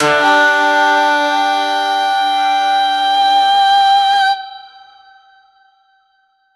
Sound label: start quiet reverb pads; tribal